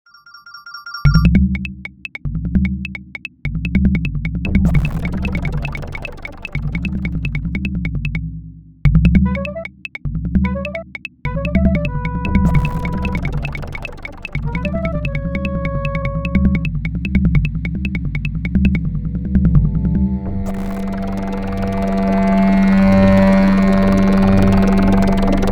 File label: Ringtone